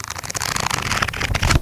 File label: Cardbox Cards